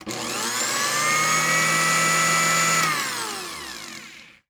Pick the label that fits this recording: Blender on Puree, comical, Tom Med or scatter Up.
Blender on Puree